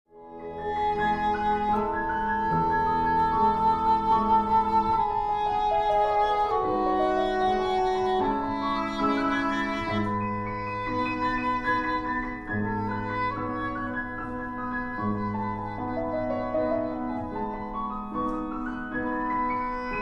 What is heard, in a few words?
solo; ravel